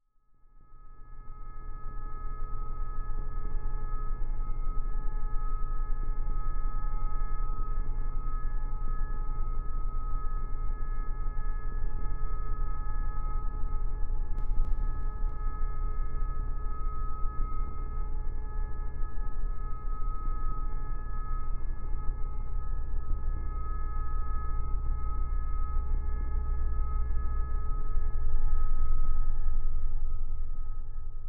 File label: Magnetic Field; 99Sounds; Magnetic Fields